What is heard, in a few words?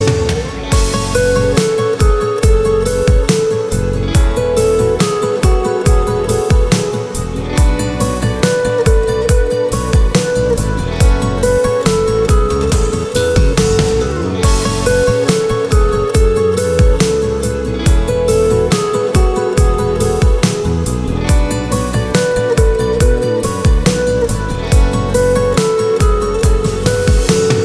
Tone